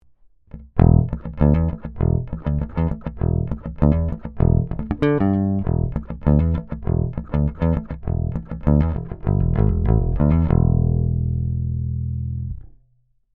Ibanez EHB1505 Neck Pick Passive

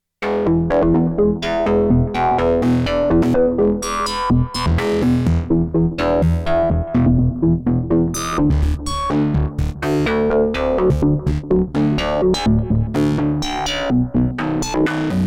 MatrixBrute Ausgang Stereo Out

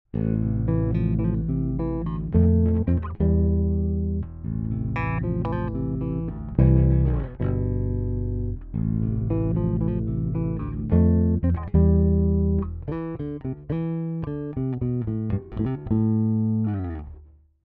Finger chords

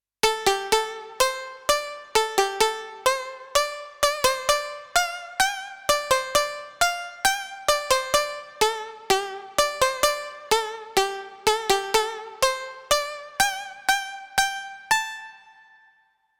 Yamaha YS-200 Koto Like

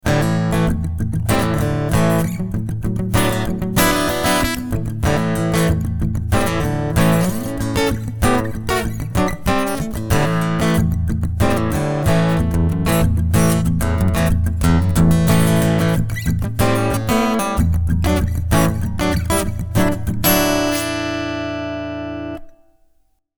Strumming Bass Mid Boost

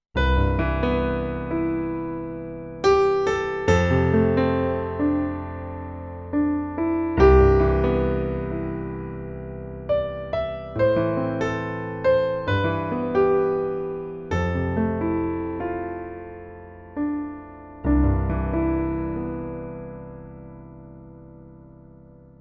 Grand Piano; Roland VR-730

